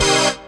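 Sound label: synth chord; Best Service Gigapack 1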